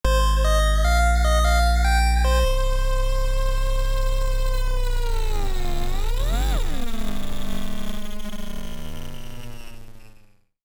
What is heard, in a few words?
stinger; Street Cleaner The Video Game